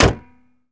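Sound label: Truck Cherokee Hood Close; SFX Kit; Trucks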